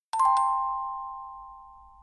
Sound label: New Message